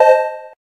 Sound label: electric, keytone